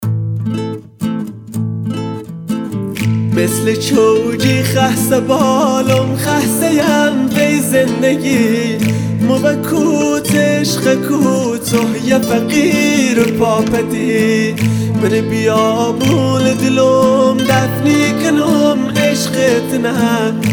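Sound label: Lori happy wedding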